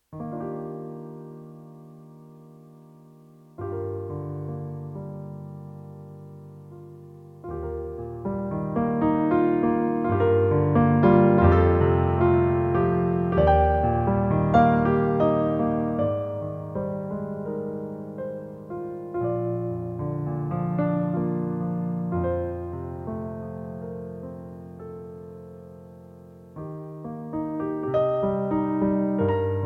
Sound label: A Bird Story (Piano)